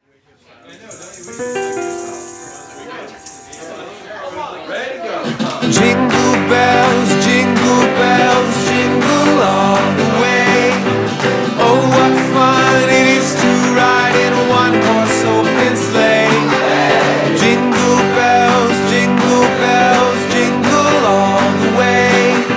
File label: Jingle Bells